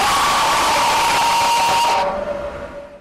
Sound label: jumpscare sound; jollibee's phase 2